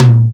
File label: Tom 505; Drums; One Shots